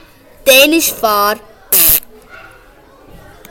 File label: fart